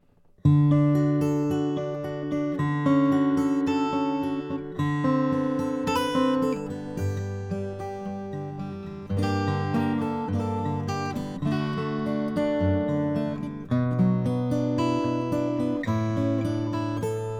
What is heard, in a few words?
r cutaway german maple, guitars; traugott